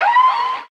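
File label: delfin, dolphin; The Wild Thornberrys Movie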